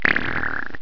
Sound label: player duct